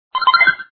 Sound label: Bonus; super fishing